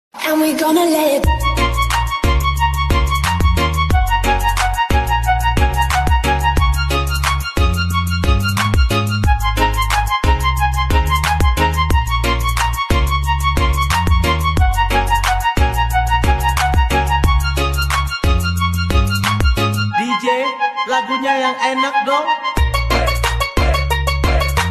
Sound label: Mobile Ringtone